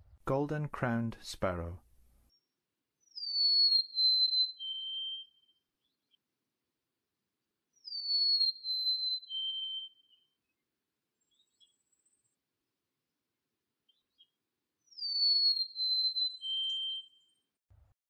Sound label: Golden-Crowned Sparrow, Songs of Birds of North America; Western USA; Western USA Songbirds